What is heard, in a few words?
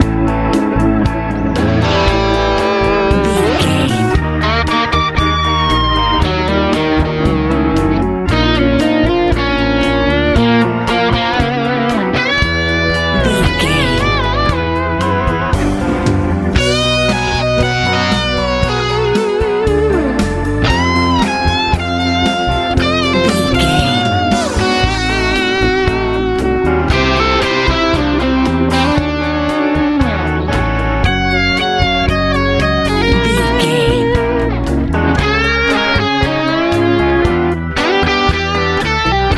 easy pop